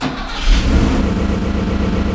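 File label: ENGINE START; SFX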